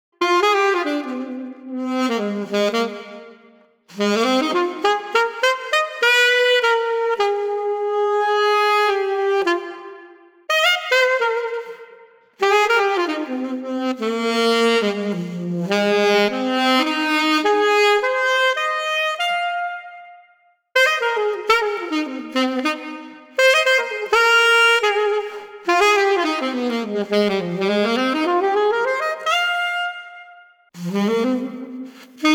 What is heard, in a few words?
Sax Cool Riffs 92